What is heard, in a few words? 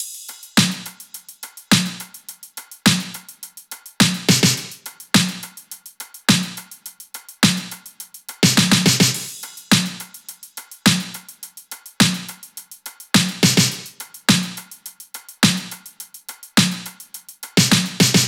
top 105, Drum Loops; SD MIDNIGHT Sample Pack; Slate